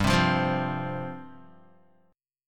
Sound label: G 7th Suspended 4th G down Guitar